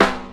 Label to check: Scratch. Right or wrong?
Wrong